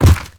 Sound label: step soft, zombie blind; Half Life Alyx; creatures